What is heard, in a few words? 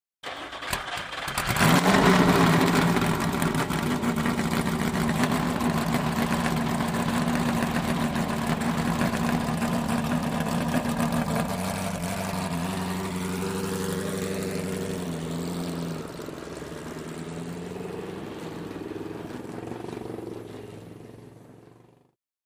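Sports Car Start Away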